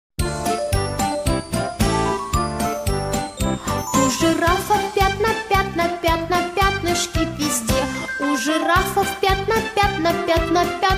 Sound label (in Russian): detskie pesni